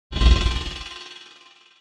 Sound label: alert sound